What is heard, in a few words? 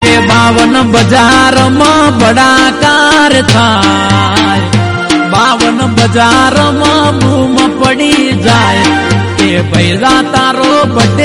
Birthday Song Gujarati